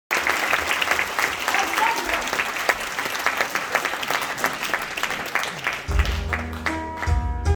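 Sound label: theatre music applause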